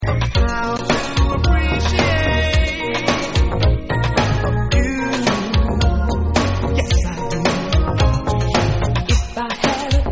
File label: Instrumental Acappella